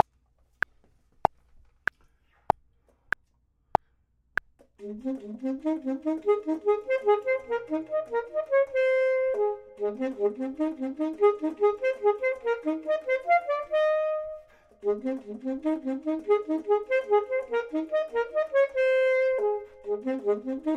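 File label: top, Saxophone and Clarinet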